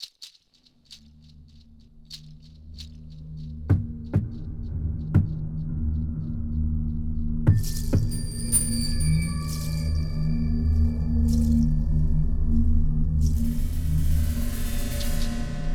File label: Amb Outdoor